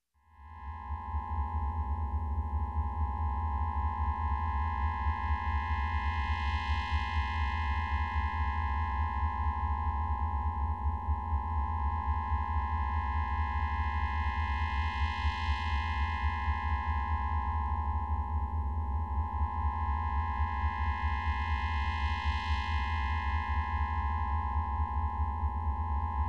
Suspensful hovering ufo pad

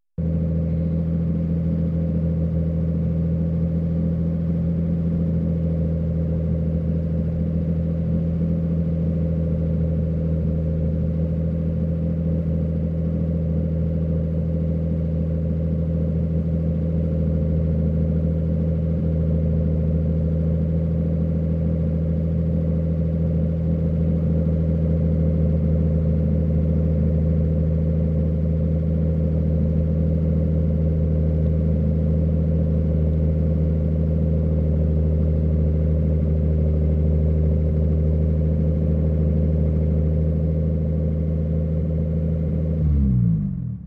Car Engine Idle

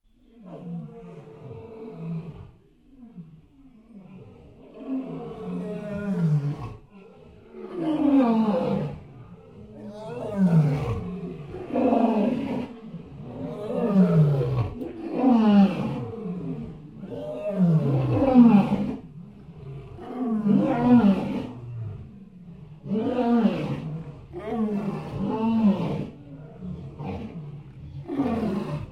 Tiger roaring painfully in zoo cage

